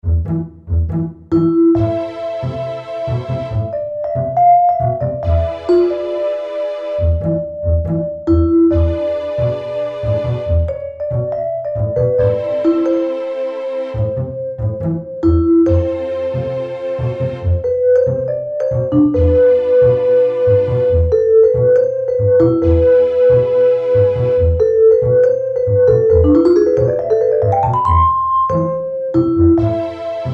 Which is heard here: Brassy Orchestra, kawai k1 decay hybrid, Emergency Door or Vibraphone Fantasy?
Vibraphone Fantasy